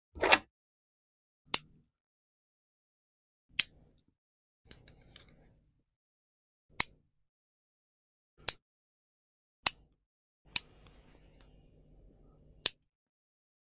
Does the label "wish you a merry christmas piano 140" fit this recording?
No